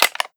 card move; MuRummy

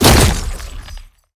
Havoc Spear Hit; MG Renewal; MUDevs S20.1-3

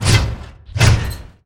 attack; Crypta; Karutan

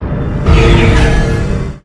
Swell of Magic Power; MUDevs S20.1-3

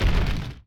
Burning Lava Golem move

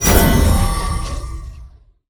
Elemental Charge; MG Renewal

